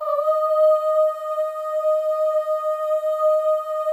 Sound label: OH, FEM, Symphony of Voices; Spectrasonics